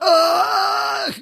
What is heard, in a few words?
killed; monsters; stalker; death